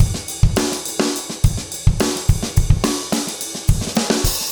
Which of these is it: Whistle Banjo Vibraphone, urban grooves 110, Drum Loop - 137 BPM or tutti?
urban grooves 110